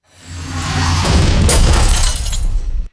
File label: whooshing smash and glass shattering